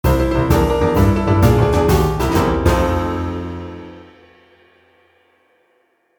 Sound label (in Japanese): アイキャッチ ブギウギ